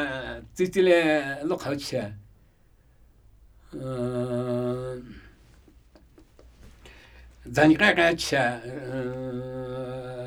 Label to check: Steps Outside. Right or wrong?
Wrong